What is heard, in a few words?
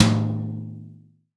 Tom Low Jazz Processed, Drums